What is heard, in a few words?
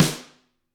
GRETSCH SNR, Double Platinum Drums; ILIO; Double Platinum Drums 1